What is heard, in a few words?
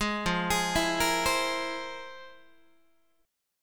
F Minor Major 11th Guitar, chord; arpeggiated; JGuitar